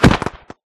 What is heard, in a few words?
crit, player attack; Minecraft